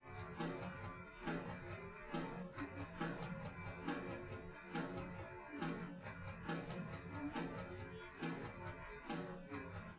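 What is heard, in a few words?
with drums live rec; SiSEC10; underdetermined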